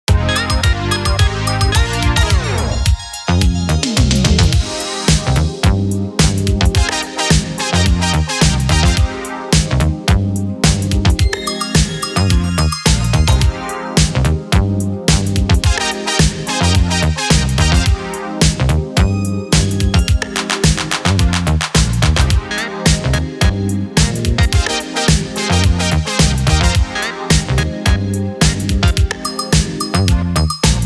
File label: Menu Theme; Knocked Up; Crawlco Block Knockers